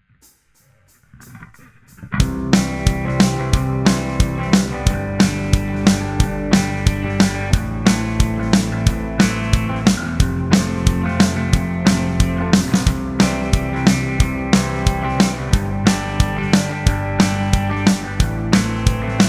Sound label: Backing Track; country guitar mastery